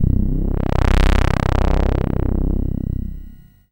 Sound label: SID Bass C; CHIPSHOP HITS